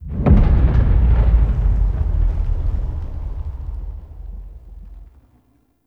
Cinematic End Transition

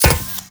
Moa Step; Characters; Warframe; The New War; Veso

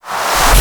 Smite Slam Rise; Warframe; Paladin

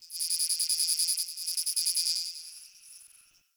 Cricket; Warframe; Tau

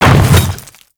Hammer Boss Land Ice, Footsteps; Warframe; Grineer Hammer Boss

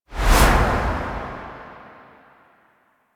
Foundry Whoosh In; Interior; Ambience; Warframe; Nineteen Ninety Nine; Tailor Shop

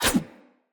Large Dagger Whoosh Heavy; Snc Large Dagger

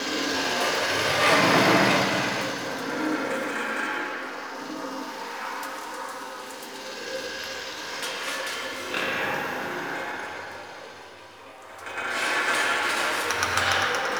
Interior Small Lab Bed Loop, Ambience; Warframe; Entrati

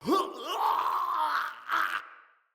Death, Soldier Male; Dendrit

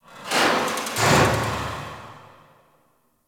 Corner Hall Rand Metal; Warframe

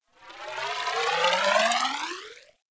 Ocean Cloning Machine Gurgle; Ambience; Props; Warframe; Grineer Ocean

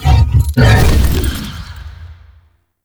Horse Slam Start, Abilities; Warframe; Duviri Horse

